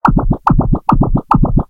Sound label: machine; Samsung GT-i7680; cmcc